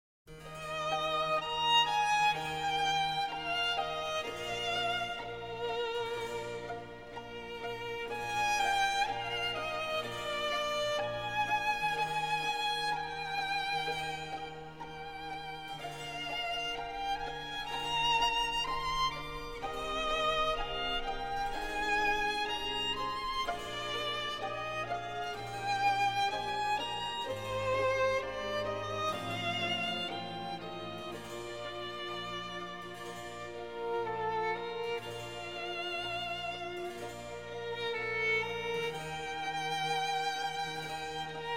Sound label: Violin Concerto in F Minor; The 4 Seasons; Op. 8, No. 4, RV 297; L'inverno (Winter); II. Largo